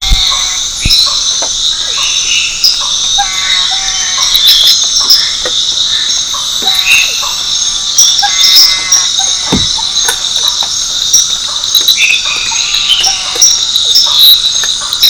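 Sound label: Hamptophryne boliviana, frog; guyane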